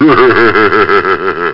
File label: hehaheha; GasWasserTest